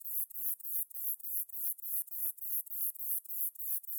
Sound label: song, katydids, neduba radicata